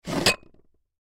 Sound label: Bowls Stack Push, Bowls Stack Push Hard Dishes; Household; Dishware